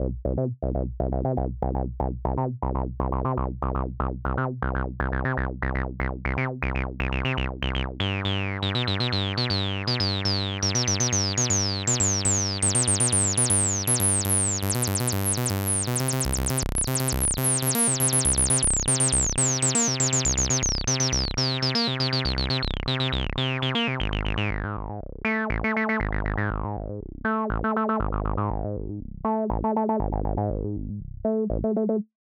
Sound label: Acid Filter Patterns; synthmaster; AcidFilterPatternsTest1